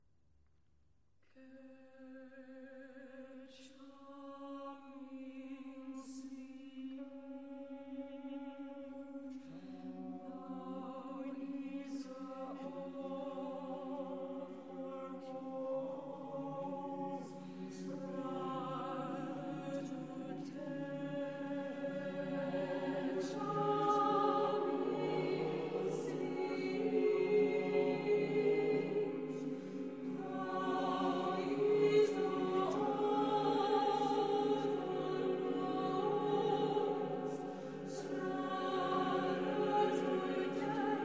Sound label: Four Mixed-voice Settings